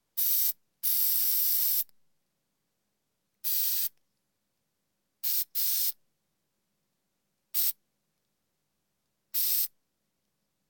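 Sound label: deodorant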